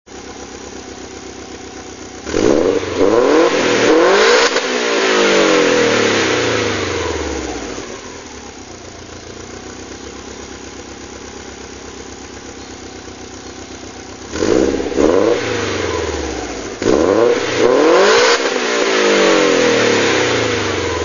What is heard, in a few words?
sound, SUBARU IMPREZA WRX STi Ver.5 TypeR; car